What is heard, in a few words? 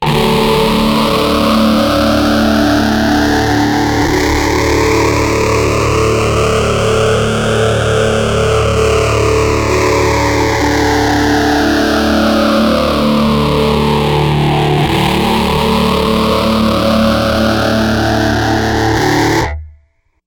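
rat guitar pt9pro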